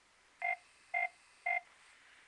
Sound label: nokia3100 ringtone